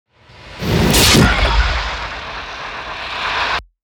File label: hit complex short